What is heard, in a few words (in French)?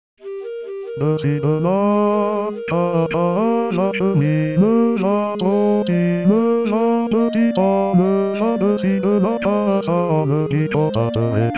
basse; duo de l ane